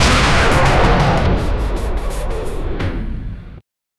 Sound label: level up, anime; one piece fierce